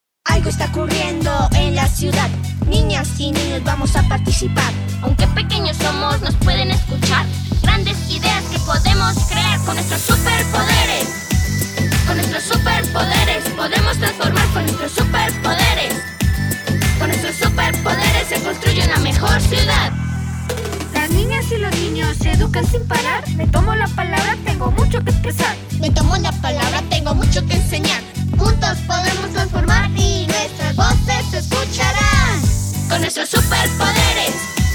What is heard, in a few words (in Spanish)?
Jingle; Programa NNEA